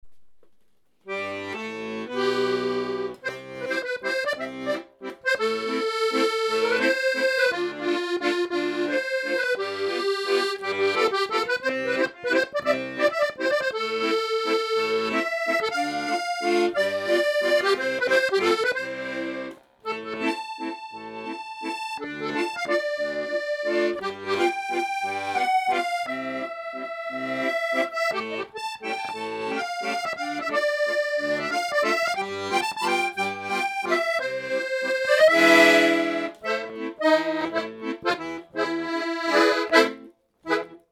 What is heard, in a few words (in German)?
CONSIGLIO tremolo, akkordeon